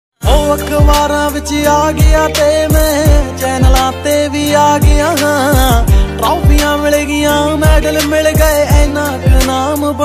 DJ Punjabi Ringtone

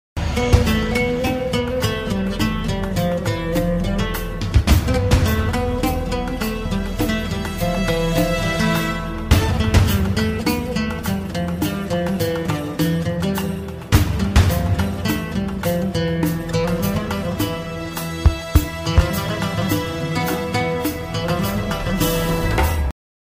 Melancholy Ringtone

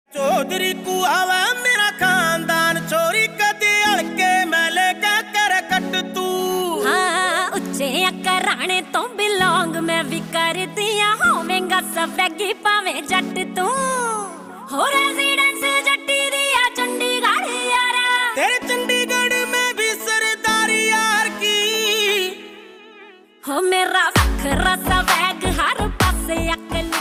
Jatt Punjabi Ringtone

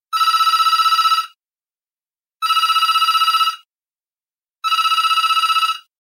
Classic Dialed Ring Ringtone

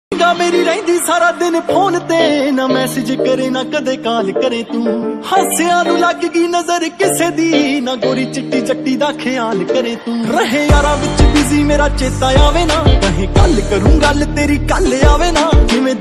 Punjabi Ringtone

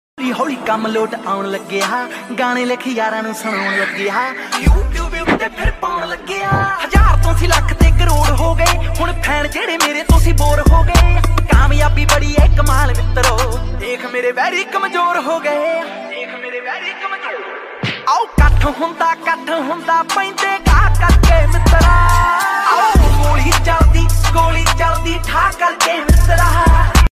Punjabi Song Ringtone

